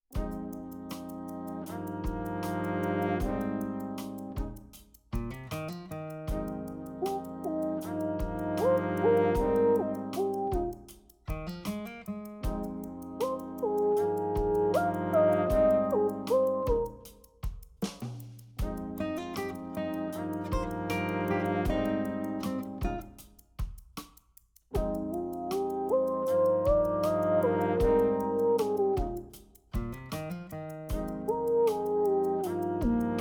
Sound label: Countdown (5 Minutes)